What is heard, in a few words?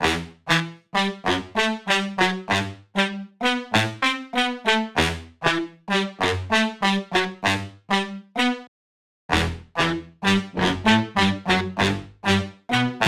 Horn Section Wet